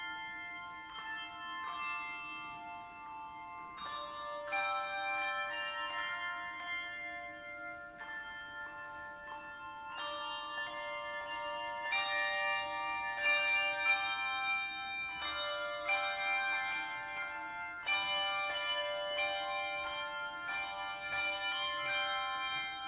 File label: Aria Handbells